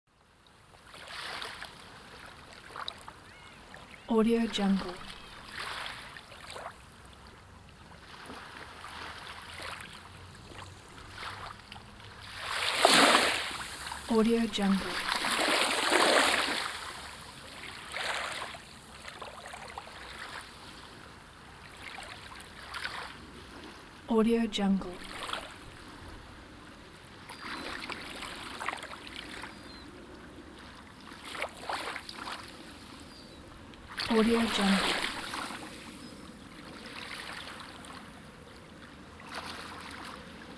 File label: sea waves